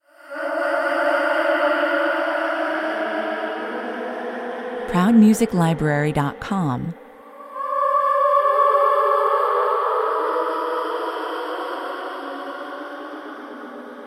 singing babies 119 bpm

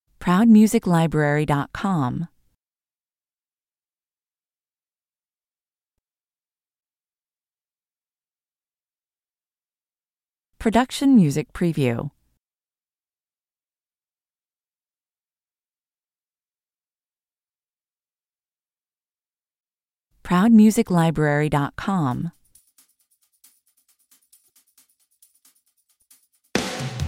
carol of the bells (drum kit only)

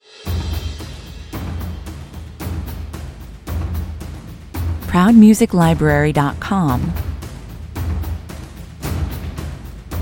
percussion mix

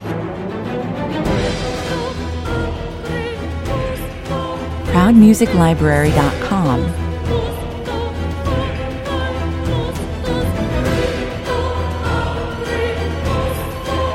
with choir and solo vocal

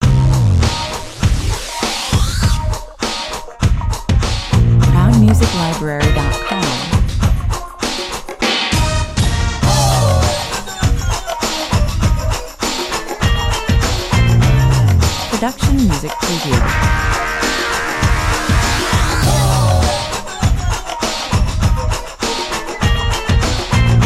heavy 100 bpm

